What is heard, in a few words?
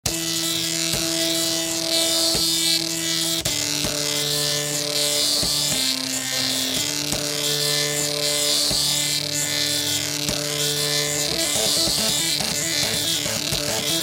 printer arp